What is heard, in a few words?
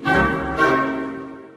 sonata, notifications; Sony; Xperia Z3